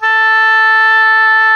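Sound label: A4 soft vibrato, oboe; Northstar - New Gold VOL-2; EBONY OBOE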